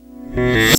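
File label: backwards BASS; E-MU Formula 4000 Series Vol. 1; Hip Hop Nation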